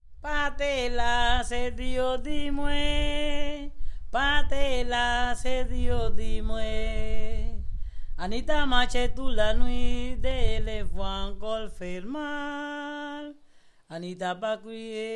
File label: merengue